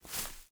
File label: foliage jump